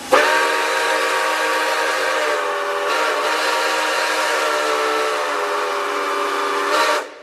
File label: 765 whistle; nkp